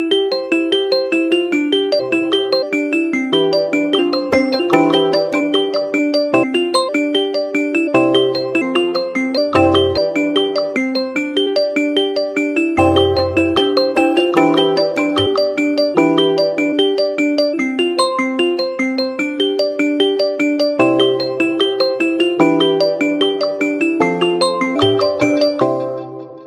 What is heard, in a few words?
redmi note 6 pro, ringtone